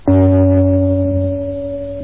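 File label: gong single long wide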